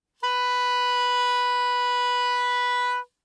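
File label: Soprano Saxophone non vibrato ff B4; Woodwinds; Bb Soprano Saxophone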